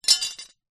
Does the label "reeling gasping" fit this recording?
No